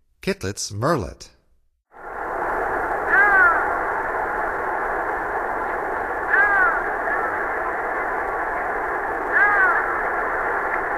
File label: Kittlitzs Murrelet, Songs of Birds of North America; Stokes